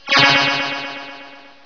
charge; tron

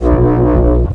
aim recharged

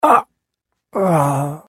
death

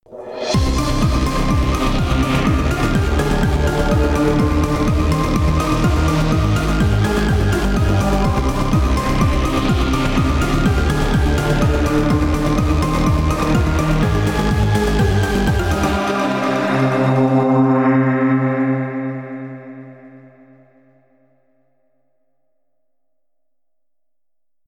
music special round

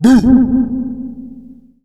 halloween boo

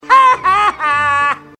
hahaha; hl2dm; oppressive territory; mask

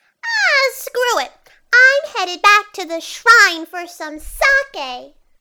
jeers; suika; demoman

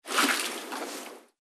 blood splash

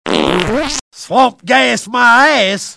swamp gas; hl2dm

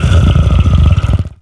alien idle; xenomorphs

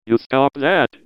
pain severe; pyro; johnmadden

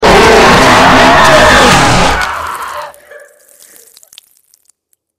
death; tf; slender; prisoner

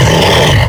attack; kfsmr flesh clown

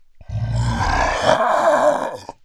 pain; agrunt

